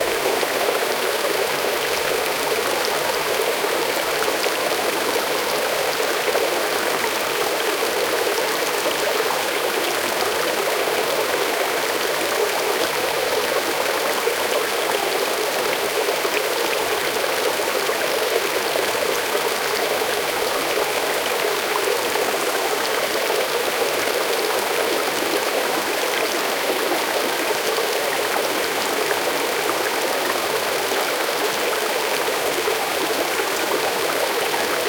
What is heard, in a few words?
gold water running, river